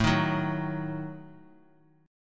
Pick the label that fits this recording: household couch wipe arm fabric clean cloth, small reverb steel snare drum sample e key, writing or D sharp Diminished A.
D sharp Diminished A